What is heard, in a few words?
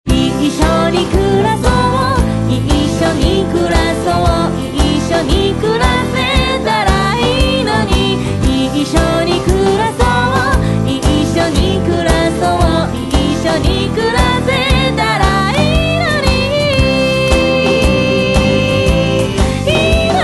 music